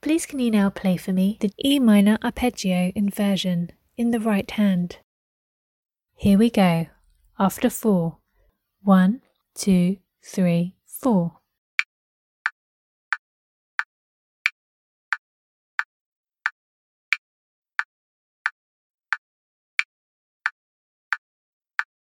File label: e minor arp inv rh